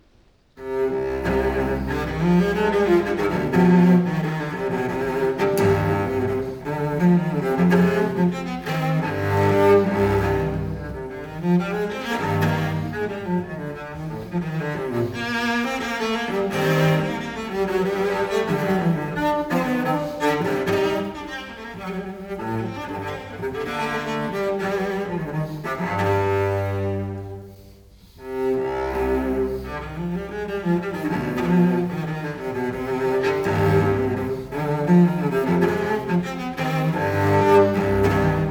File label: suite for cello solo no 5 in c minor courante; johann sebastian bach